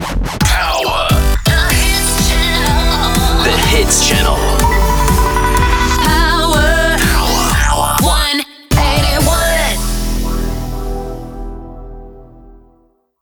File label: Jingle Theme Slogan; Power 181